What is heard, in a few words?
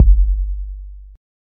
subby kick single shot g key